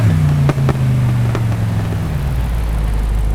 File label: revdown; vehicles; nfs heat ganimator pack